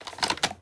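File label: inspect movement, weapons; Valorant; cstrike; operator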